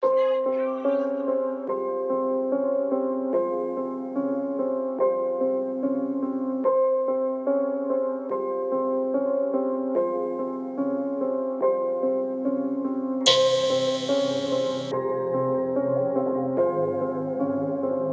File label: 37-39-41hz Low bass